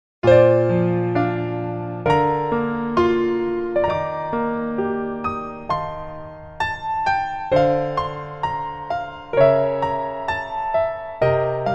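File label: Piano version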